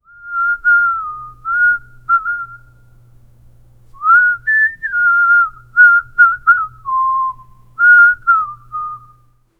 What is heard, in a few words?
a slow melancholic whistl; whistle